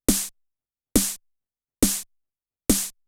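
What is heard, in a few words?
pitched snare drum, techno 138 bpm; Best Service ProSamples vol.54; AKAI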